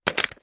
reload magin; gmod

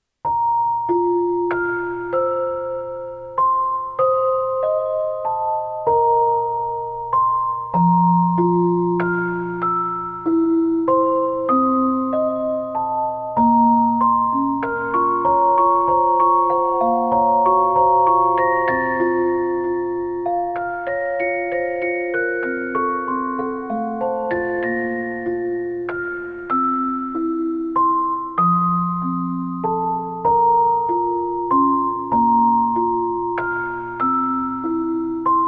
A Different Kind of Work (Celesta); Finding Paradise Soundtrack

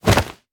melee attack fist; viper

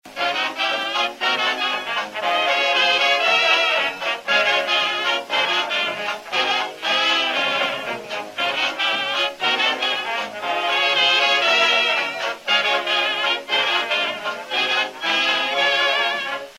ringtones; gmod; perp2.5

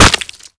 bullet flesh head; gmod; viper

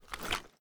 settle, weapons; gmod; viper

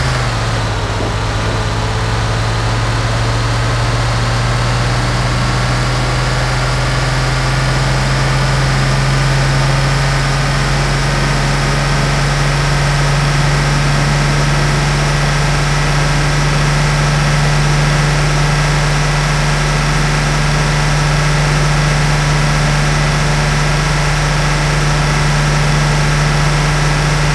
fourth cruise, vehicles; gmod; tdmcars; gtav; bus

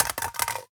phys mag drop ak metal concrete, magazine drops

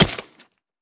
frag physics bounce mud hard; gmod; viper

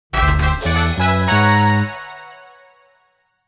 start grid fanfare; mario kart double dash!!

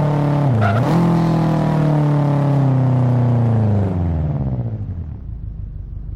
slowdown slow, subaru impreza; vehicles

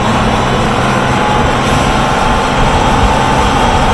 apc throttle 2nd; vehicles

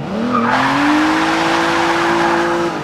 first, vehicles; rr phantom drophead coupe

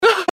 hurt, npc male; gmod

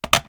reload fast mag mag in; gmod

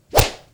slap hit; gmod

